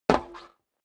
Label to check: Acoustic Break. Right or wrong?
Wrong